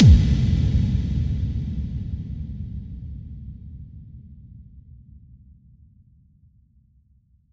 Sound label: bassdrum reverb FX kick; Vengeance Samples